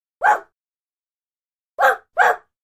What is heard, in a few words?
Dog Barks 2 Various Mi; animals dog